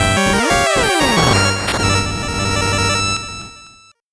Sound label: 8bit jingle slow